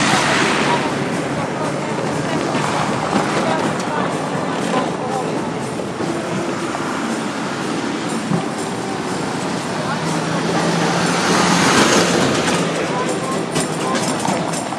street music

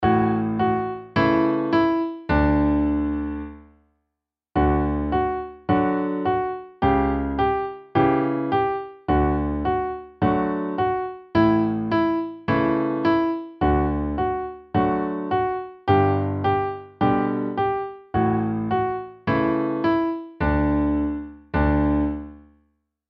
violins cowboy

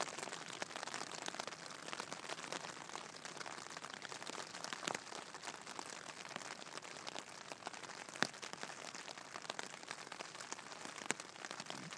good morning sounds from the big chill

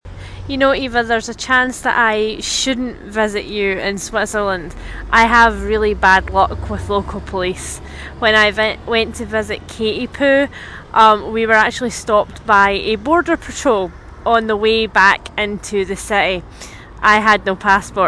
police

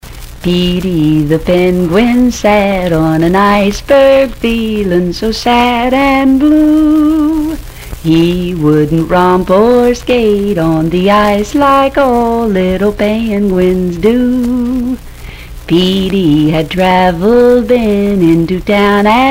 grandma singing

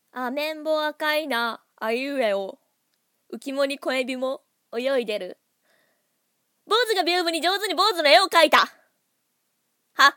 haha

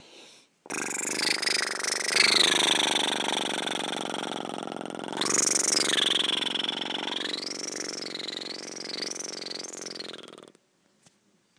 baby soothing ufo noise

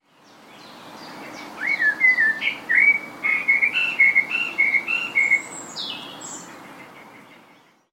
odd blackbird phrase

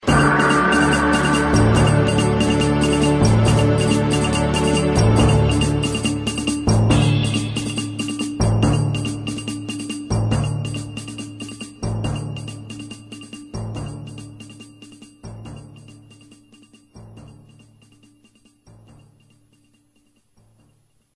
news sounder

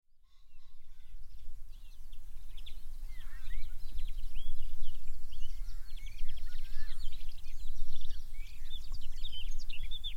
birdsong near pond; at ringstead

